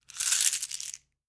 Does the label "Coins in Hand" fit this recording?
Yes